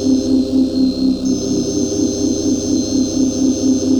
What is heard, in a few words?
Solina, pad